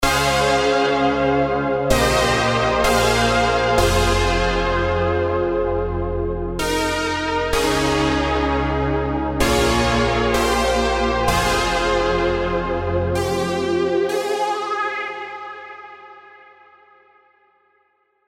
Rebound; Spire

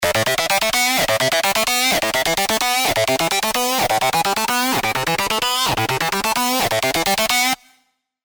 Acid Loop F (128 BPM); Sylenth1; WHO

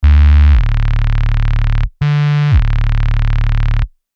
Sub; tropical pops

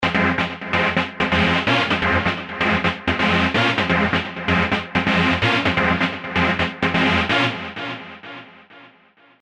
Synth Loop F 128 BPM; Sylenth1

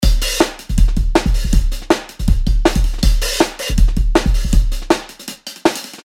Breaks 160 BPM; Alcohol; JUNGLE JUICE

